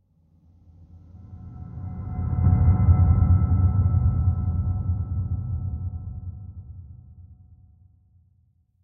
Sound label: Drone Metallic Cement Transition Tone; Hollywood Edge; sound designer's toolkit v2